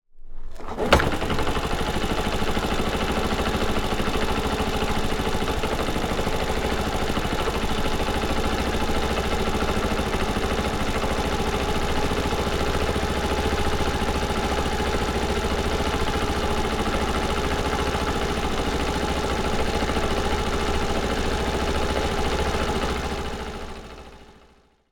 cub engine start idling loop; anemoi

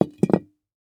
pot open; anemoi

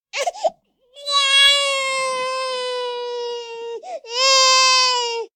baby voice; anemoi

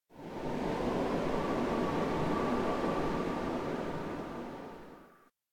wind corridor wind; anemoi

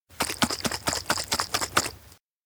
jar shake mayo; anemoi

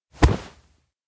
poke side; anemoi

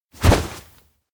fist thrust; chiharu; anemoi

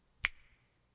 finger snap; fumiya; anemoi

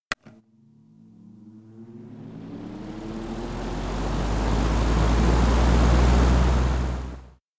fan wind; anemoi

